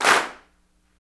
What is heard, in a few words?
CLAPS, Africa Handclaps